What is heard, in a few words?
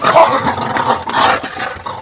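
die, alien controller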